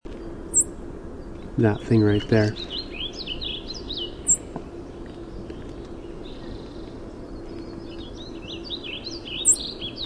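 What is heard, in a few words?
Golden-crowned Sparrow call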